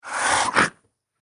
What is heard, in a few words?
Beast Monster; horror; Andrew Fleming